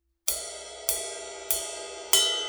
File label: sabian 20 aa rock ride